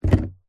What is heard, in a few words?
industrial power steering fluid in plastic bottle set down garage floor